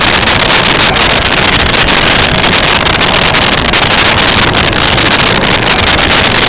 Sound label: pod steady hi loop